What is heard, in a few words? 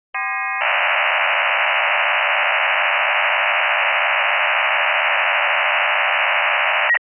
ofdm